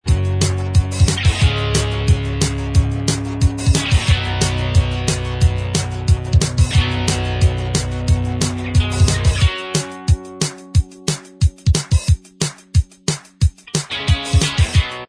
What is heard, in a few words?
Inst